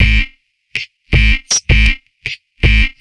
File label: Structured Rhythm, IDM Rhythms